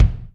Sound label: BD basc, Yamaha DTXpress Acoustic Kick